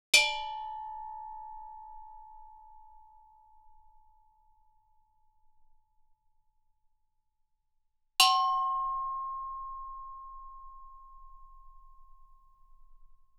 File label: Gamelan; Propeller Island - Complete Gamelan